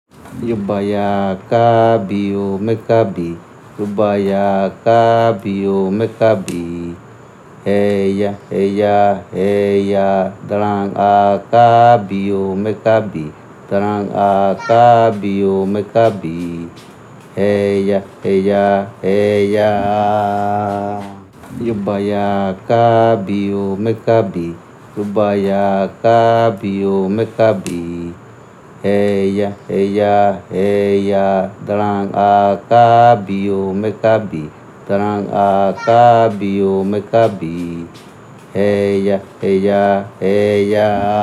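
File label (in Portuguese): Canto da Anta, tapir; Paiter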